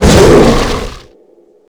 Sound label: pain boss